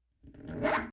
Ice Climbing Indoor Wall Tightening Crampon Strap; Miscellaneous Sport

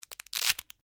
DRY BRITTLE SNAPPING; Various Organic Foley sounds

